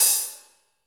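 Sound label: HH, hi-hat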